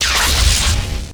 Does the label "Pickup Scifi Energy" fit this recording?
Yes